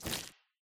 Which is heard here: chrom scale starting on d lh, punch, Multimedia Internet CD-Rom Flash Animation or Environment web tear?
Environment web tear